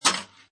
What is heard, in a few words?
Pinball Sound Metal Plates Release; Video Games